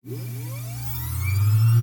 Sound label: Spin Up